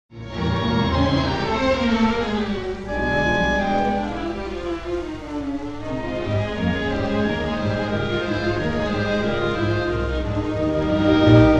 Symphony No. 1 in C minor Un poco Allegretto e grazioso; Brahms; Op. 68